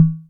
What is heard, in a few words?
midtom, Yamaha MR10, drum machines